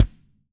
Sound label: KICK, Living Drums; Sampleheads; Peter Erskine; PB KICK+TOMS